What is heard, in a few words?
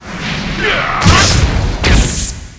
dark priest attack